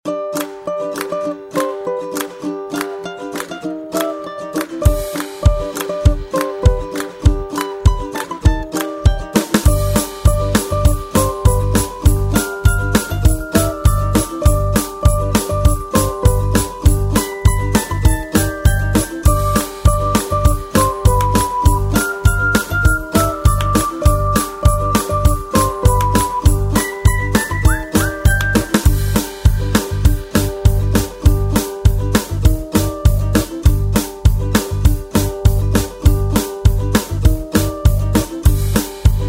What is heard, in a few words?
Folk Ukulele